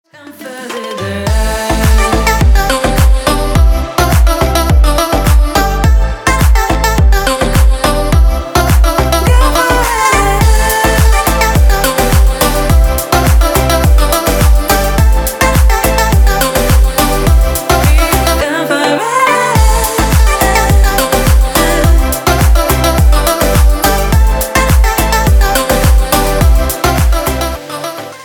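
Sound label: dance ringtone cut for phone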